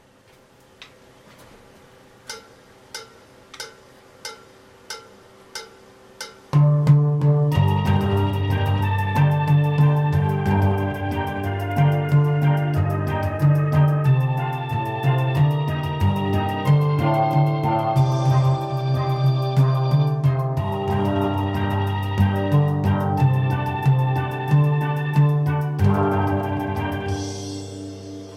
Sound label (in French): basse solo tenors; bella-ciao